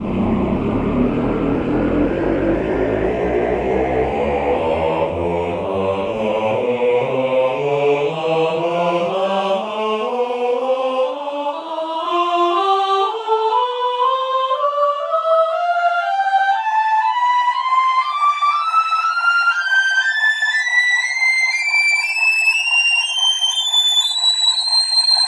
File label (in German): ahh choir tonleiter